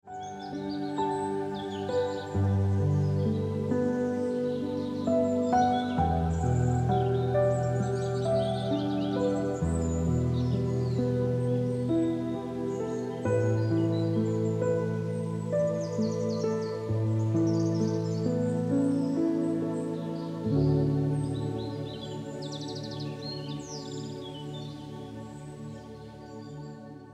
Lofty Hills Ringtone Piano Music

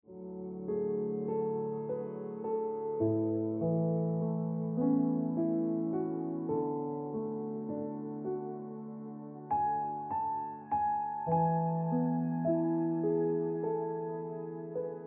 Ringtone Piano Music

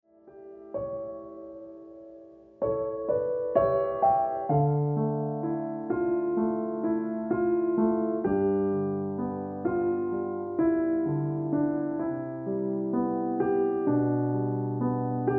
Dancing Trees Ringtone Piano Music

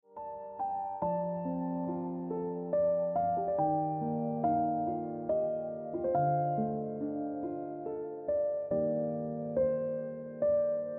Ringtone Piano Music

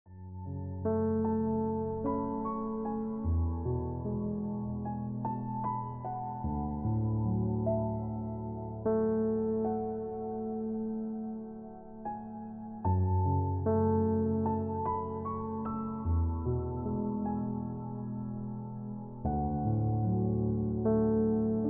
Into The Woods Ringtone Piano Music

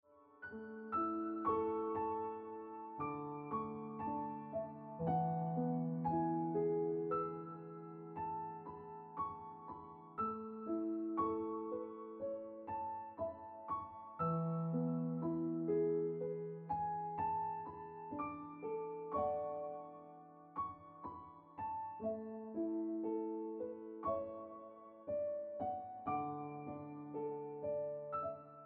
Piano Music

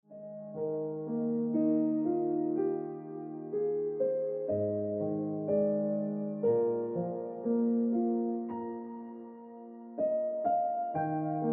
Like A Radio Song Ringtone Piano Music

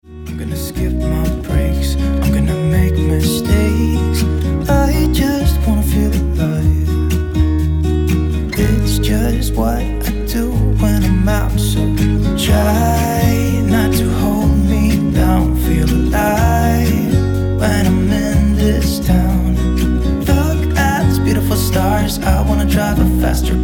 Acoustic Version

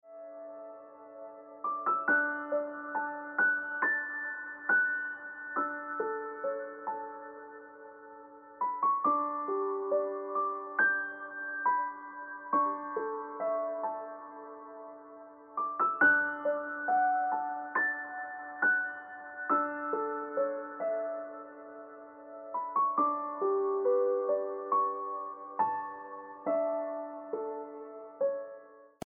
Ringtone Piano Music

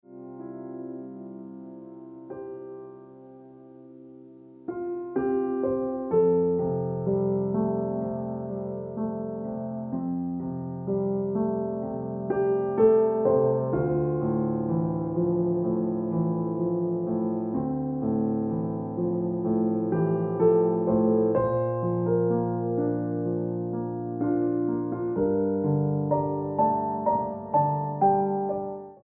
Ringtone Piano Music

